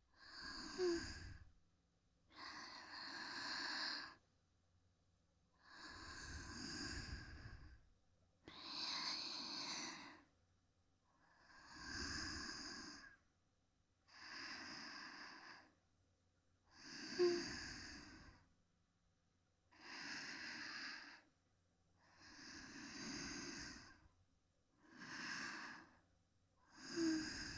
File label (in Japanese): 寝息; SEあり